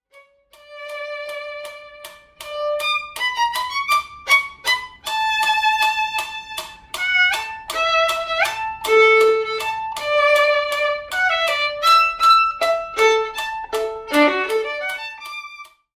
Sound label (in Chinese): 第四號學生協奏曲 第三樂章 小提琴 示範演奏; 賽滋; 新版 篠崎小提琴教本 第三冊